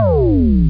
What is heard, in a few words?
Exclamation; TotalDomination